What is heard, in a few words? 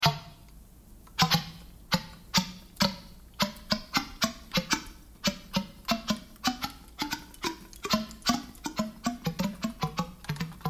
thunk noise